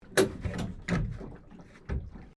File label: Boat Small Engine Inboard No Start, Inboard boat engine running badly; Vehicles